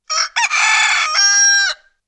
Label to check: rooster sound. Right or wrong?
Right